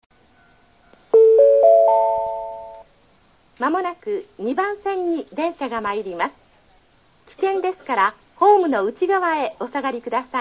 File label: miyamae track2; nishinihon